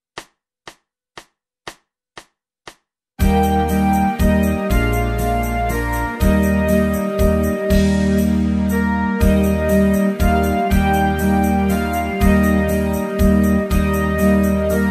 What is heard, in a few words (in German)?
Il était une bergére Französisches Volkslied, es sax; Schulband Musizierklassen